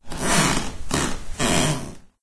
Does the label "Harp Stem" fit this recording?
No